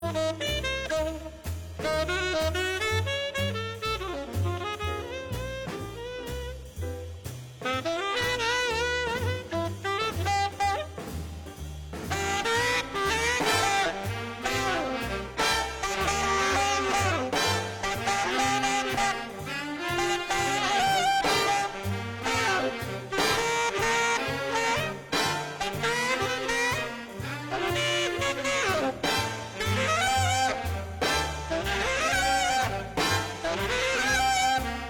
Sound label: Jazz Quartet